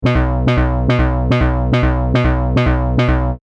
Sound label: krautrock pan; tellun